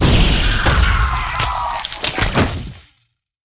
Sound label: Boss Mech Scorpion deth, monster